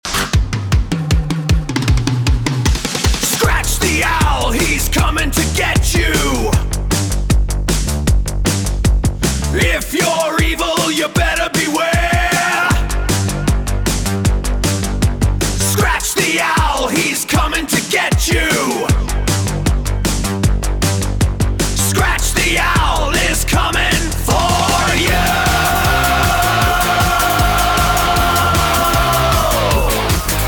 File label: scratch